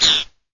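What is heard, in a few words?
Giant Red Condor attack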